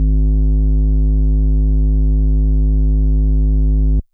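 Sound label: FM Sq Dist DX100 G#3; square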